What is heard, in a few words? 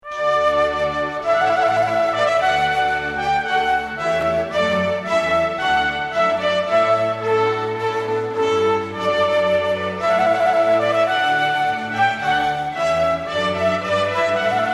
Trumpet Voluntary